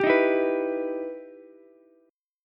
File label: E Minor Major 7th, Ukulele; 12,15,12,14; strummed; JGuitar